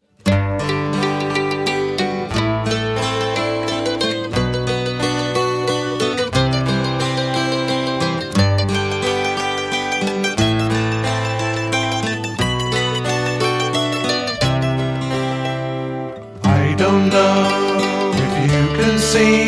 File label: Ceilidh Folk Band